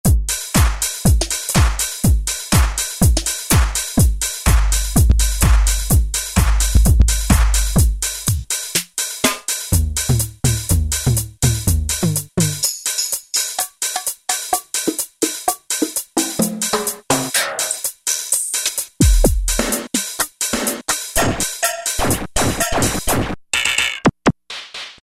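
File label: drum-YinYank; E-mu Orbit V2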